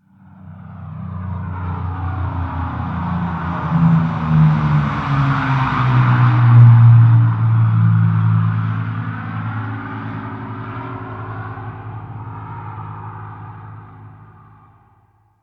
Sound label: airfield hangar passby